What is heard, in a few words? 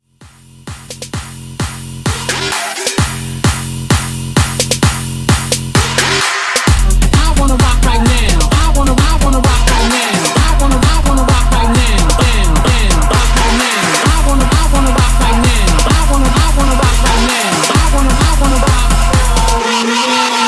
EDM Mashup; 130Bpm